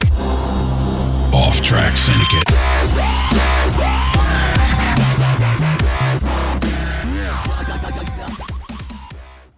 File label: stinger